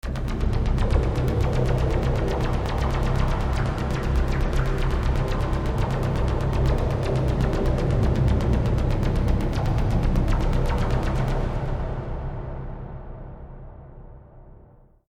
Rhythmic Drone Musical SciFi Trailer Techno Tension; Drones; Sound Design; BBFX